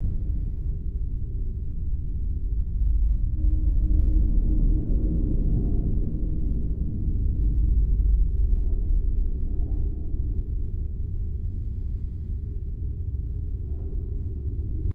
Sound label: deep metallic low frequency rumble